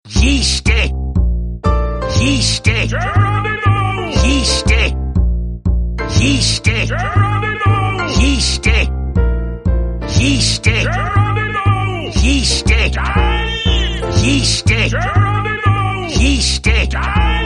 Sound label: yeasty jazz